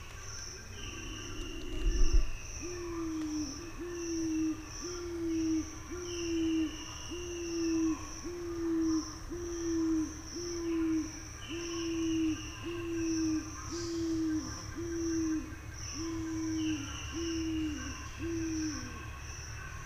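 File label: Columba unicincta, pigeon; kongolezskiy golub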